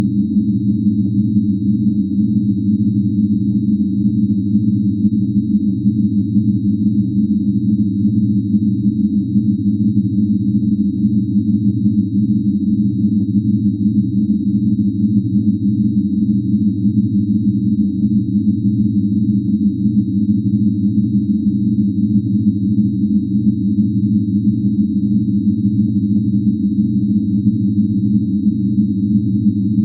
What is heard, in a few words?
monaural beat